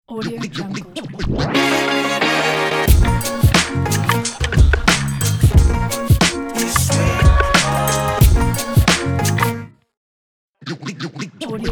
upbeat urban hiphop ident

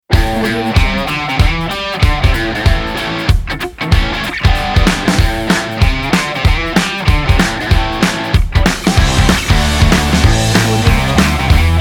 happy fun indie rock

